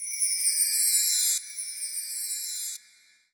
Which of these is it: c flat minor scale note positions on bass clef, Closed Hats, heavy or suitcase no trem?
Closed Hats